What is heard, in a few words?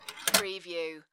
Pinball sound, roll and pass metal gate